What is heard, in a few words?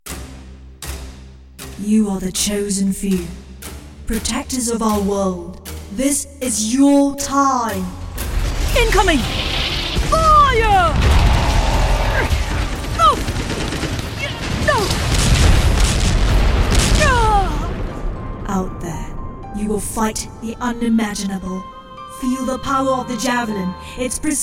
Gaming